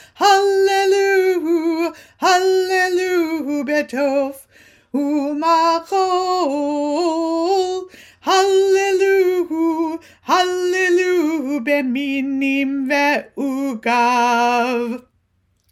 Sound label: Psalm 150 Verse 2; Kulanu Joint Saturday Morning Service; Shabbat